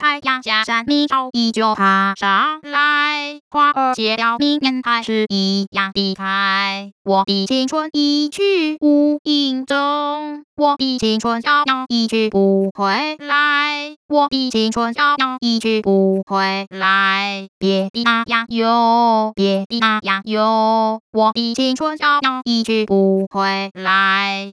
Synthetic singing voice (female), No coartcltn, Chinese; Mandarin Singing Voice; Pitch Coarticulation for Mandarin Singing Voice